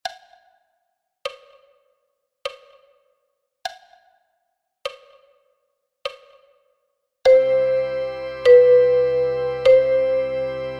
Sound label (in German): Bach Choral SBFL und ABFL